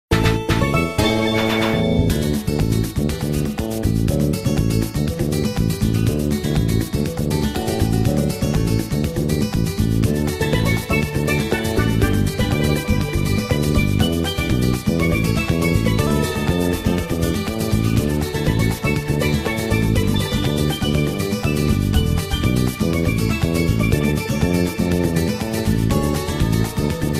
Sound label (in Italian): musica; MKDS; Spiaggia Smack